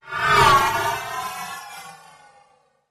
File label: Hard Hollow Sweep